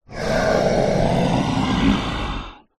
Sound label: horror monster growl wide stereo; zapsplat